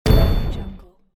game explosion

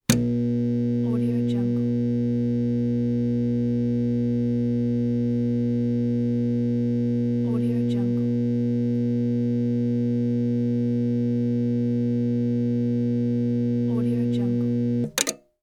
electric transformer powerup

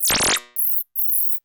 computer compute old school

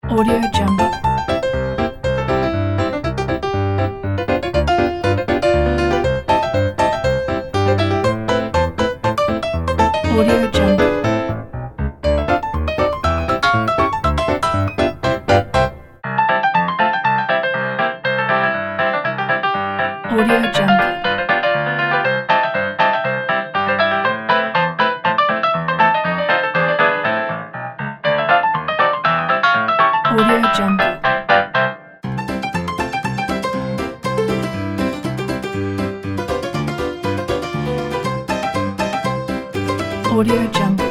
ragtime loop

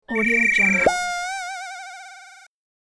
cartoon whistle pow swirl

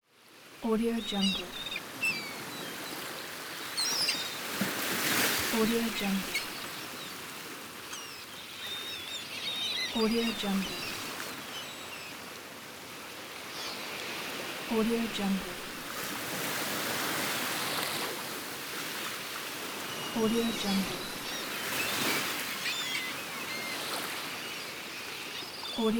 sea and seagulls

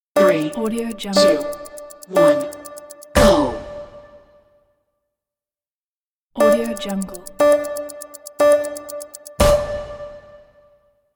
game start countdown; kuksaudio

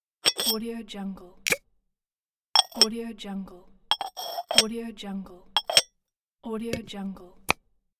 jar lid open and close

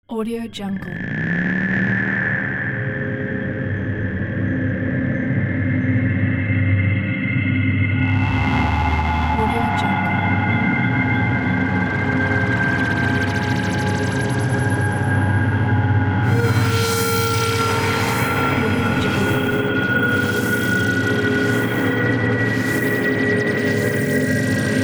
aliens atmosphere

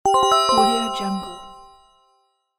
slot machine win

